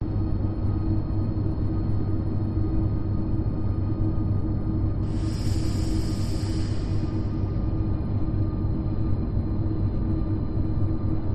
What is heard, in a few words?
AMBIENCE ENGINEROOM LOOP